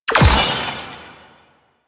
Belt Fed Rifle Active Reload Fail; Weapons; Warframe; Tenno; Frumentarius

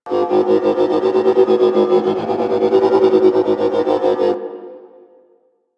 Gas City Track Loop; Ambience; Props; Warframe; Corpus Gas City; Props Remaster

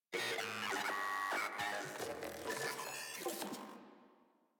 Moa Vocal Idle; Enemies; Warframe

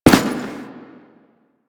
Step Default; Warframe; Quests; The New War; Veso

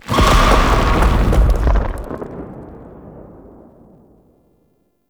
Drunken Flame Pillar Aoe Burst; Characters; Enemy; Abilities; Circade

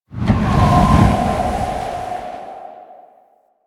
Balloon Move Start; Warframe; Quests; The New War; Vehicles; Unum Tower Balloon

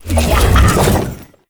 Power Shroom Cast; Warframe; Nokko; Empowering Mushroom

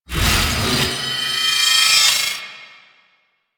Heavy Hammer Attack Charge; Warframe; Duviri; Dax; weapons

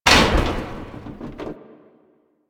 Military Checkpoint Wall Destroy; Warframe; Ambience; Nineteen Ninety Nine

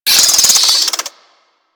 Infested Minion Pain; Warframe

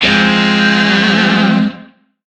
Shawzin Phrygian Chord G; Music; DE; Warframe; Lizzie Shawzin

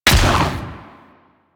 Vomit Gun Frenzied Shot, Weapons, Infested; Warframe

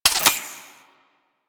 Modular Secondary Clip Mech, Weapons; Warframe; SU Modular Guns

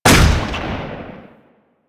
Rifle; Warframe; Weapons; Bolto

